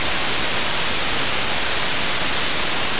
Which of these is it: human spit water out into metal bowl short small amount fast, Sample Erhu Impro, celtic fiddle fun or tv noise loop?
tv noise loop